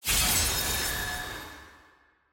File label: sfx mini wings platinum; challenges